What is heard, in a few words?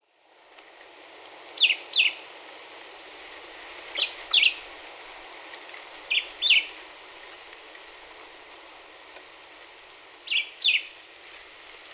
Black-whiskered Vireo Songs